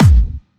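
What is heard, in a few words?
BD Clubby, bass drum; VEC1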